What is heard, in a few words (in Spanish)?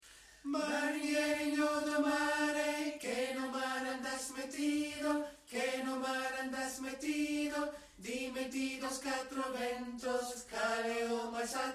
muÑeira tenor